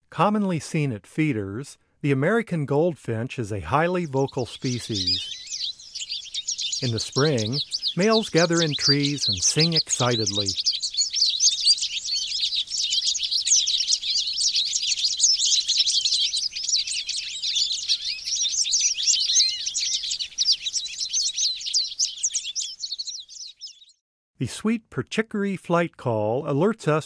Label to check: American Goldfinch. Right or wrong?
Right